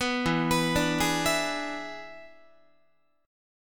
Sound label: E 7th E down Guitar; E7 arpeggiated; JGuitar